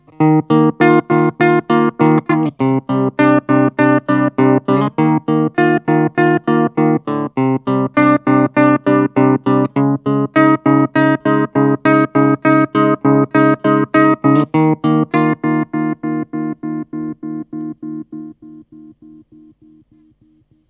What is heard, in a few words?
pulsar chop; psst